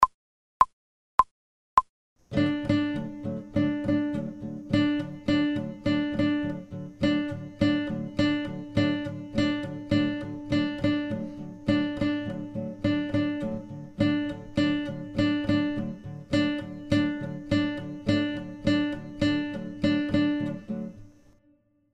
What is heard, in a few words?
Dampening Groove fast